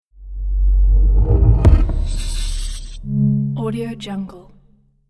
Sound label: animation tech logo reveal